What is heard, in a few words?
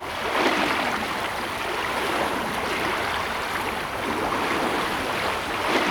ship engines; World Of Warships